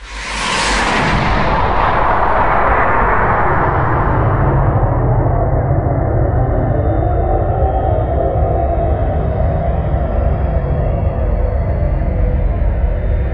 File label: powerup